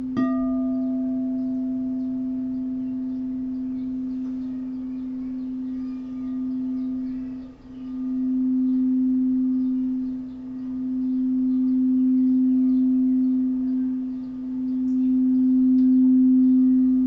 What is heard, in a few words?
FOSCA 432 C4